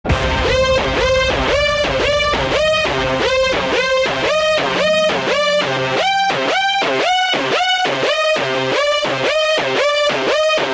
win music

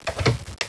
Door Open; Roleplay

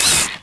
pain, houndeye; gearbox

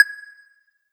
timer beep; svencoop

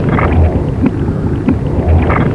lava; svencoop

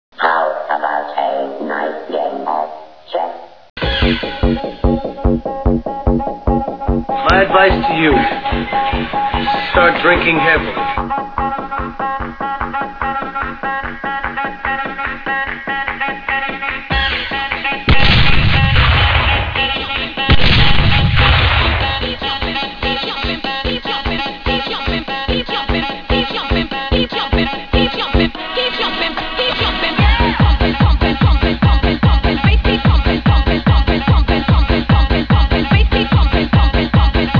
Techno; tfc

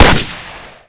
uzi fire; firearms

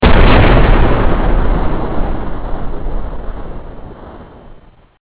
kaboom

